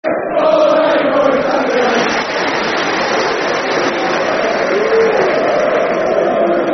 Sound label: If You All Hate Norwich Clap Yer Hands, Football Chants; fanchants; Ipswich Town Itfc